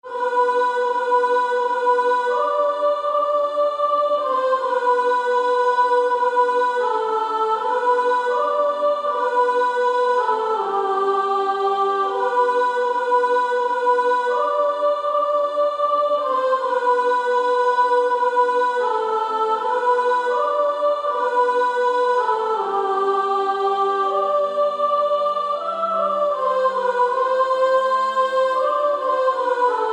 Female Choir S1

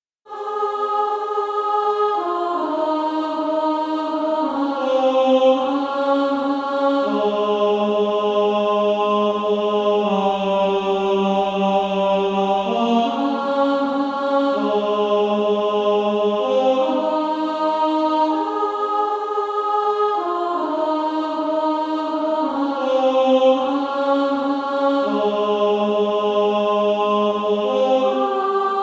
Female Choir; 1st Alto

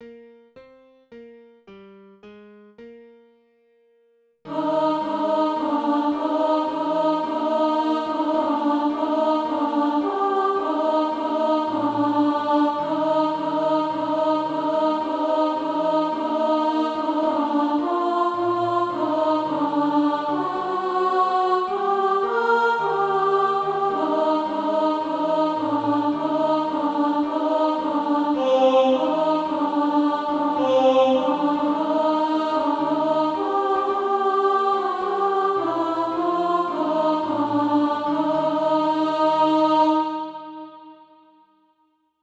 alt, SATB, Practise Music

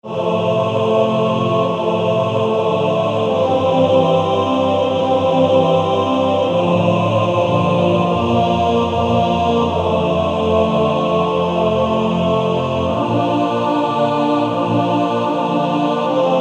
Male Choir TTBB

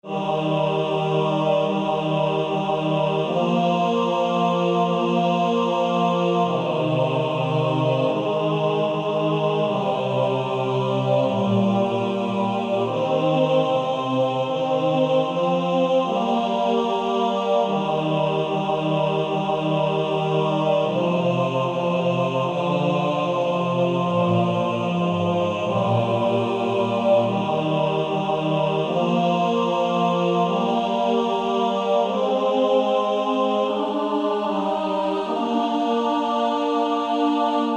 Male Choir TB

